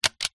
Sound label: leisure toy gun plastic shoot single